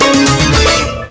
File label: alert twoinky